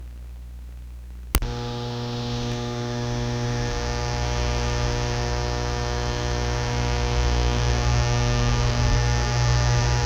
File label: continuous low electrical, electrical